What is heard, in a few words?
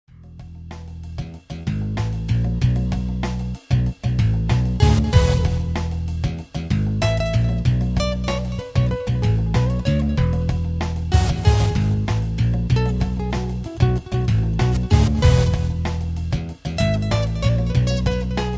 gsyn